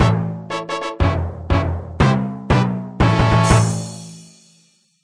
Firemaking level up

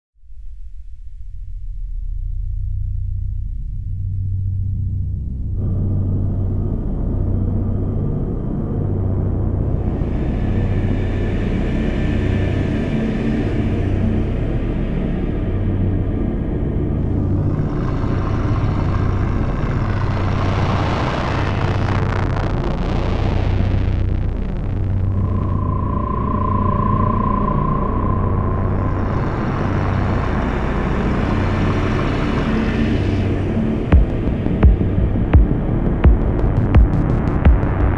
Bass Attack; quake 3 arena noise re-engineered soundtrack 1999

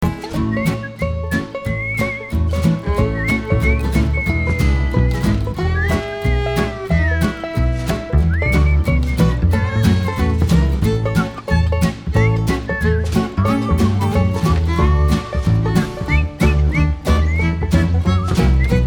background; Toy Story 3

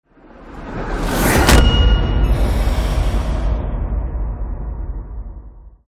Logo; Chroma Shift

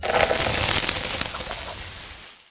Ice Ball Freeze; CastleMiner Z; Xbox 360

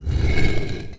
moving wall move

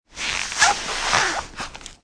FENCING; Five Nights at Freddy's; FNAF 2

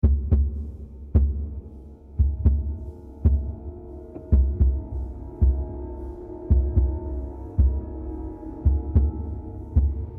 Combat Suspense; Cannibal Combat 1 Suspense Chunk 2

